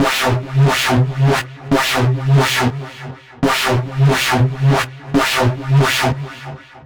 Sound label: Whooaa, сэмпл синтезатора дабстеп